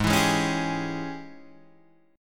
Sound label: G sharp Major 7th Suspended 4th Sharp 5th, Guitar, Open G